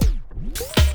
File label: SCRATCHES; Scratch 1